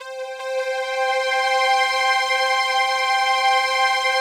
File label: PAD, DIGI-PAD